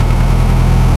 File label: steady two stroke kart en